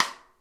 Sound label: SNARE; Sonic Reality; Interactive Drum Kits